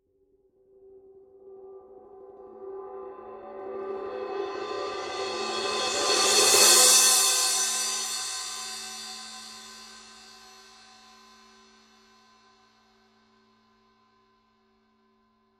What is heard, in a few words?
rude 17 crash-ride roll, paiste